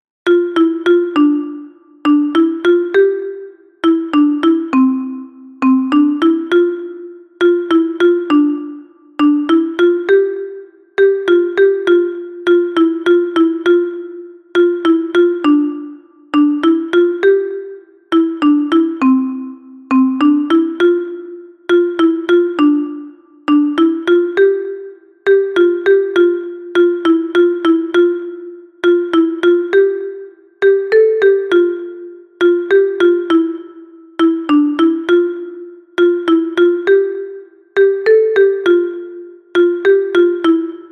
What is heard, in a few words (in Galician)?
O DIA DAS LETRAS Xilofono Contralto